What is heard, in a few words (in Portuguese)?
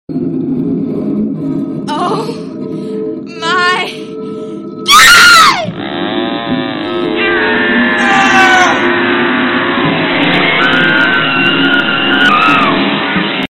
grito de terror oh my god